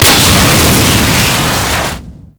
Tunnel Fire Start; Grineer Spy Vault; Warframe; Grineer Galleon

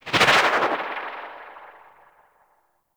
Atmosphere Flyby Left; vehicles; movement; Archwing

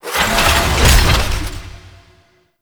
Orbital Station Air Lock Close; Tau; Interior; Orokin

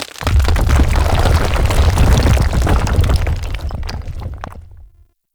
golden maw rock sink; Gameplay; Warframe; The War Within

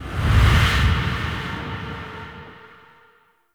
Ghost Ring Deactivate; Gameplay; Warframe; Chimera Prologue

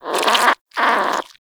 Vocal Snore, Hunting Critters; Characters; Warframe; Nexifera

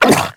Puppy Idle Fall; Vocals; Warframe; Kubrow

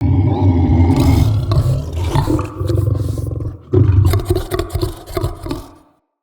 Behind Wall Attack Growl Warning; Vocals; Bromius; Animals

